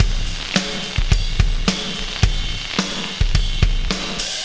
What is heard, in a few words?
System Overload Beat, Processed Drumkits